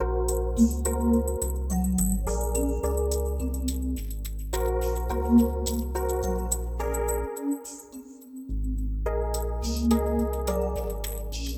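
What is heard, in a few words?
music mountains; yo frankie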